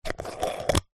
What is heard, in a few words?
food vegemite jar lid spin open; zapsplat